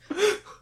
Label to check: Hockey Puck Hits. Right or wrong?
Wrong